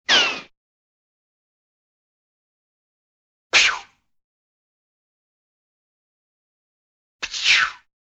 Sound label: Whizz; The Look Back SFX